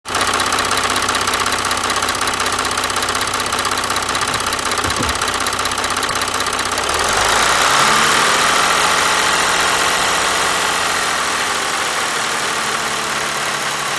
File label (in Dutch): stilstaand onbelast, bijgeluid motor in deellast